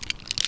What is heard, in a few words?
spas reload end; spas12